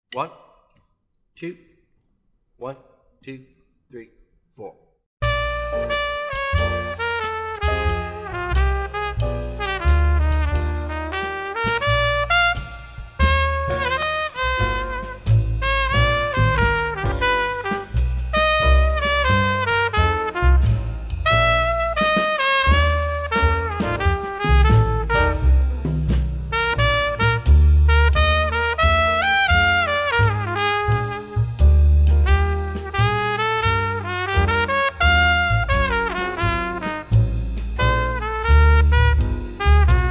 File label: swing trumpet